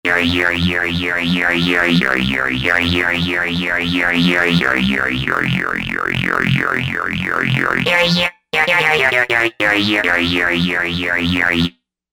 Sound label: Talkie Dub